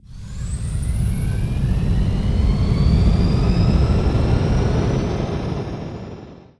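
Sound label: startup; PC Gamer IT CD 47; USAF; USAFDEMO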